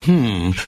hmm male; ooh ahh; human

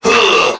huh male, Voice

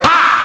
"ha" scratch; Voice; ooh ahh

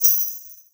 ding a ling a ling, Bells; Misc Percussion

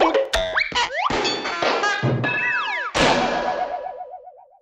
clowns

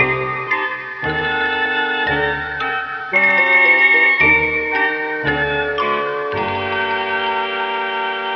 strings, Loops; Phonky

